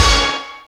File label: HIT, Orch.Hits; Roland LCDP08 Symphony Orchestra